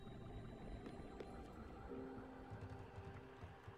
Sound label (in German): schiff; spiritfarer